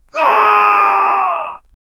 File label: human battle cry angry